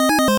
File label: 8bit se